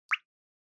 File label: Water Drop High Dry